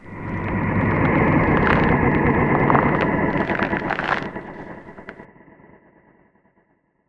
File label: Creak; Barotraumatic; NightmareCreatures; StationCreatures; Husksprinterold